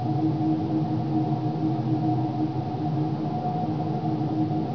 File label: ambience scifi; valve